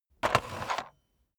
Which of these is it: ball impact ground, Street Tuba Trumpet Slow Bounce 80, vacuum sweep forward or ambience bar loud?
vacuum sweep forward